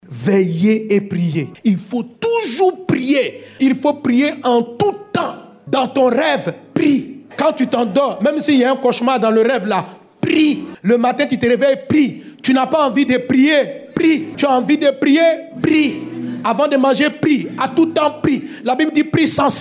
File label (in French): REVEIL SON